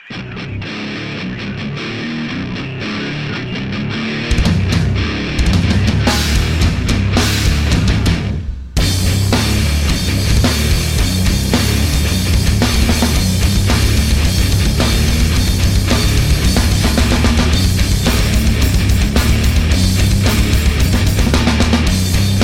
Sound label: awesome heavy metal